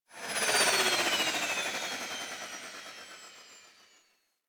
Archwing movement wind down; Warframe

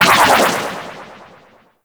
Turret Proj, Galleon Fight; Vor Galleon Fight

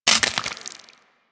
Mech; Uzi; Warframe; Infested

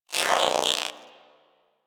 Vocal Pant, Pets; Warframe; Zanuka; Zanuka Companion A

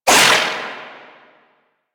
Void Projectile; Weapons; Warframe; Duviri; Incarnon Adapters

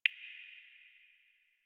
Computer Room Beep Misc; Ambience; Warframe; Nineteen Ninety Nine

